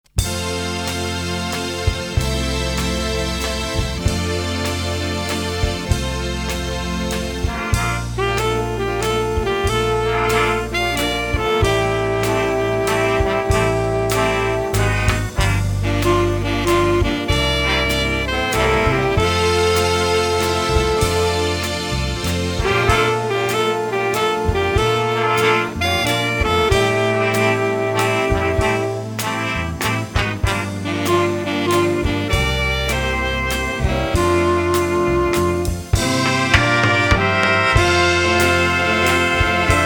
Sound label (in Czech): waltz